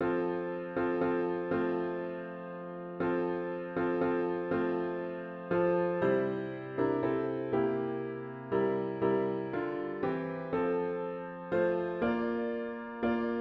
piano only; Into My Heart

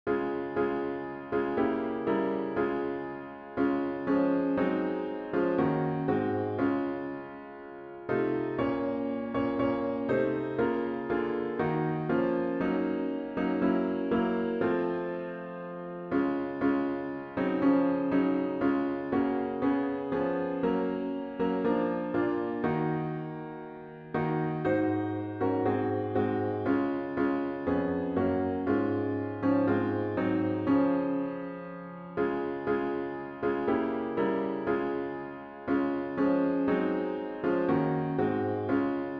piano only

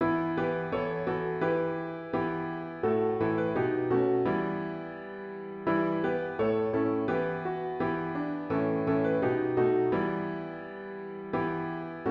piano only; Now the Green Blade Rises

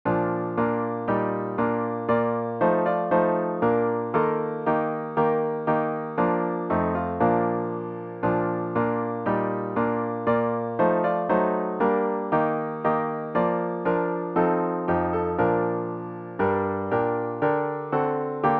piano only; Sight and Hearing

